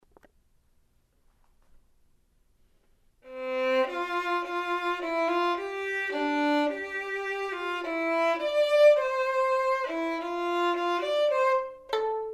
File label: vln perf